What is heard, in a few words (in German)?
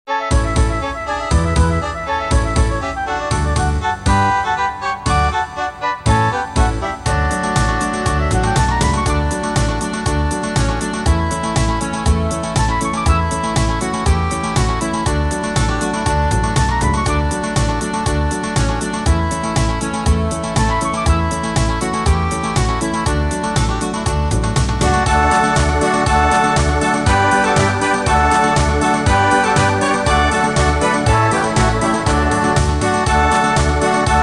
zirkuslieder gross ist die freude playback